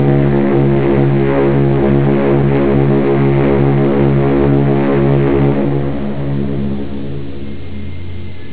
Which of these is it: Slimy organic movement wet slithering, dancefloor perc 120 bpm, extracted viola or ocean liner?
ocean liner